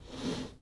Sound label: Foosball Blocker Slide